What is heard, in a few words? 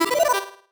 marimba dice; ゆめ2っき